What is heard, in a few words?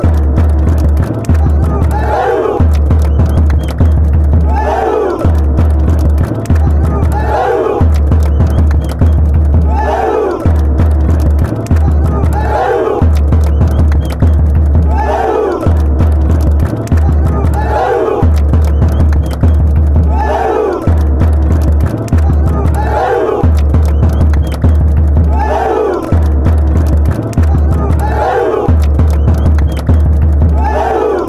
peru drums fanchants